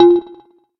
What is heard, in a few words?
electric, keytone